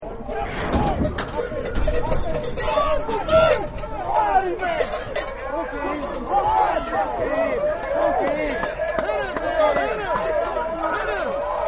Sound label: Rodeo Starting Gate; Partners In Rhyme